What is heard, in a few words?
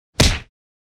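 Slapped in the face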